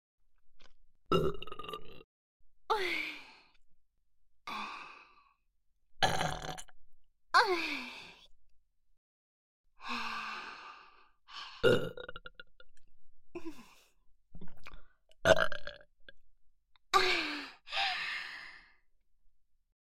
ゲップオンリー; JKゲップ ナツミ ～M男調教～

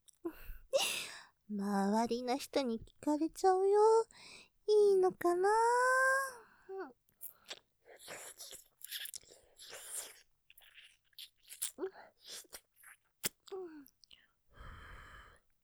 舐め, ASMR; 周りの人に聞かれちゃうよ??ｗ; ループで楽しむ！シェフの気まぐれ抜き抜きコース; モノラル

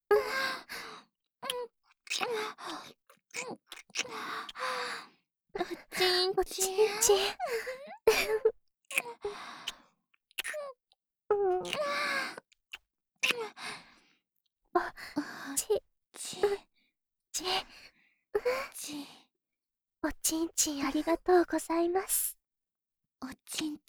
キス; Wオナサポ; L花純R琴葉; おまけ オナサポパート

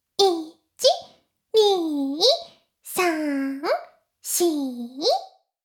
妹 しこしこ音声; おにいちゃんアイスぅー！

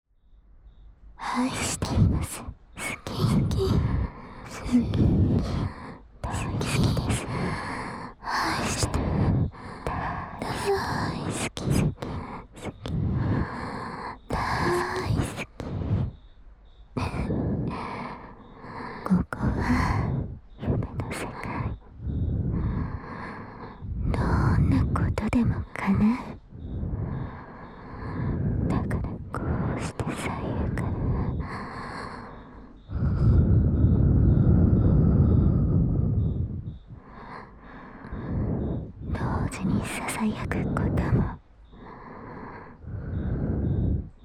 大好きに溺れる両耳舐め, ASMR

